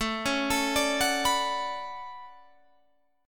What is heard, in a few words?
D Major 13th A, chord